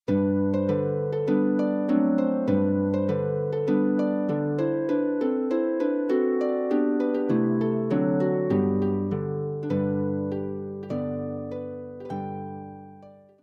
Welsh Traditional Tunes the bells of aberdovey, harp; clychau aberdyfi